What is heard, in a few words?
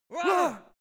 human vocal two scared men